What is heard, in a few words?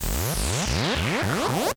charge no echo